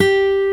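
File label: G 3 B 2 OVATION, acoustic guitar; AKAI S6000 CD-ROM - Volume 1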